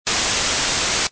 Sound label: whitenoise